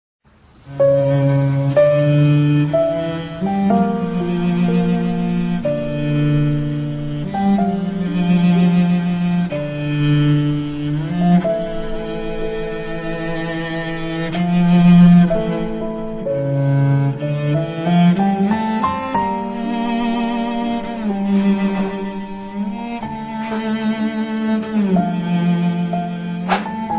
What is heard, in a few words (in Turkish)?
Piyano Cello Tema